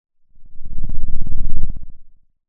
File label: Sound of a lion’s roar